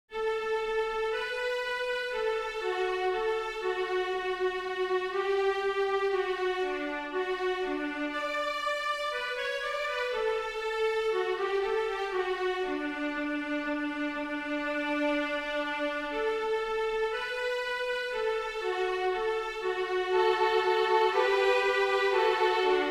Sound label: Rounds Kookaburra